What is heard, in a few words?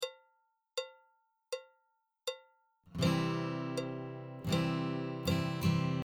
stroke; guitar